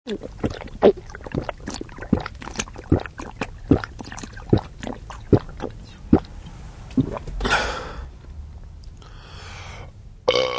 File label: Drink N Burp; Human Vocal Ringtone